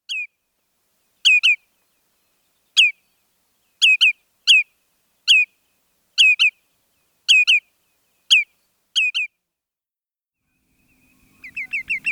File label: Lesser Yellowlegs